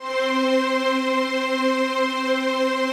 PAD, DIGI-PADS

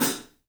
3D Drums, TIGHT ZILDJ; 3D Sound

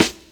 SD, Dance Drums

